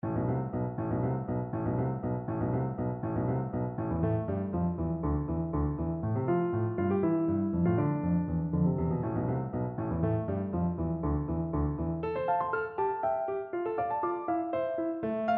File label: scherzo, Flute; bruckner sym 7